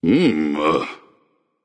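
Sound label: nonverbal ugh; smite; voicepack; merlin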